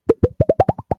Bubble

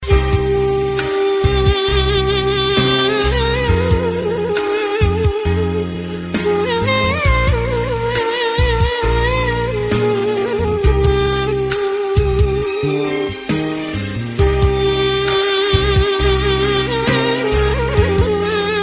Sad With Duduk

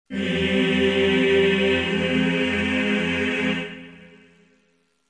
message

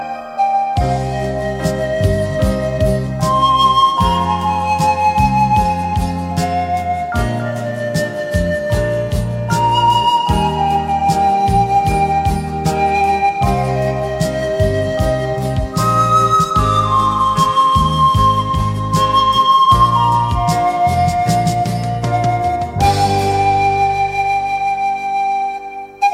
Flute Symphony

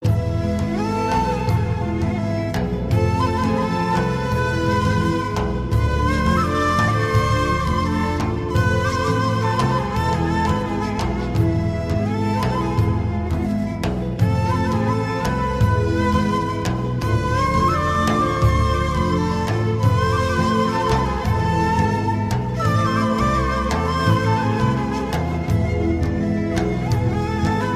Sufi Music

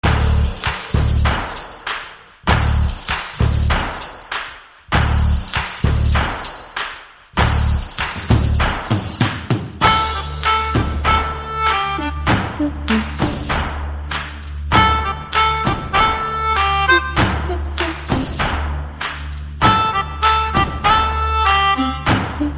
Bgm

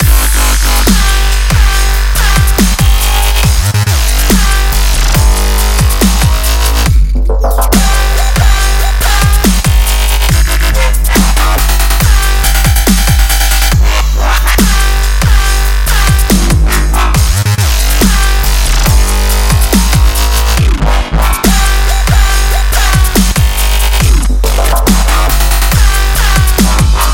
Beats; Blitz Crank

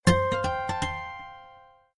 Guitar Alert

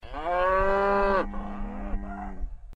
Cow Sound

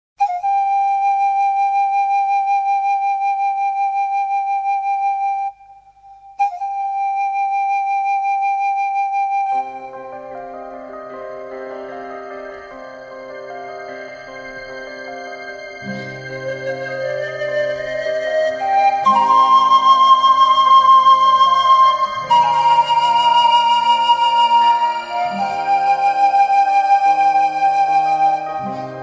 Flute Song

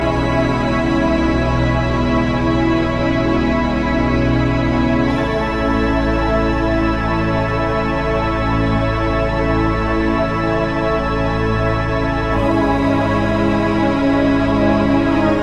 Church Organ Music

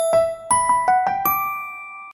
Message Sound

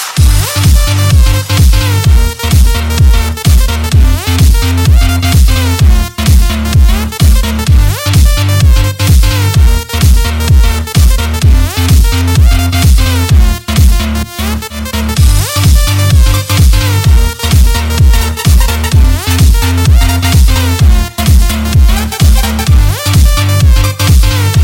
Kick Da Bass

